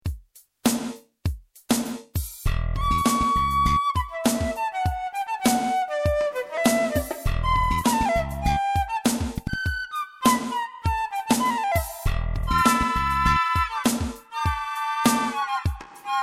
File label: flute